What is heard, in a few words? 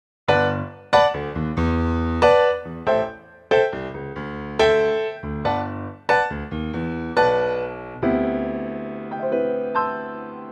G piano; key - G - vocal range - D to D; Stunning old standard